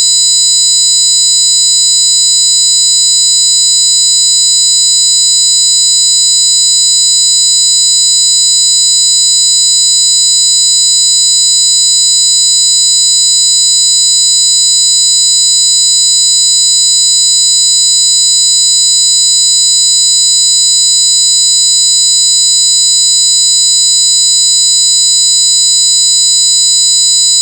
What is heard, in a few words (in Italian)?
acufene notched 4k 8k; acufenici; alcuni suoni acufenici